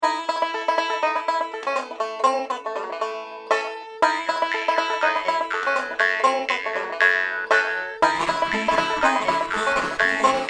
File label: Gold Strike Theme Music